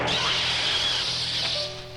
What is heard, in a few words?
Roar; Tsunokeler